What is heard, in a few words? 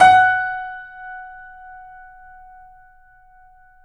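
piano, F#, right; InVision Interactive - The Piano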